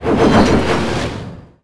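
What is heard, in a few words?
graffiti finish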